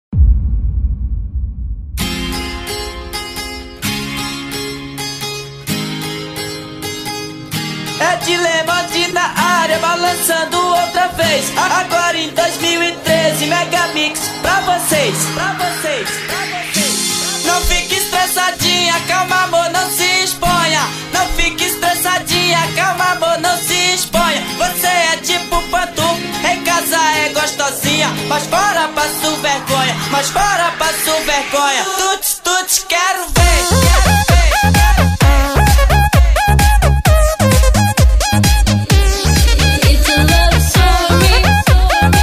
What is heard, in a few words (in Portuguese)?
eletrofunk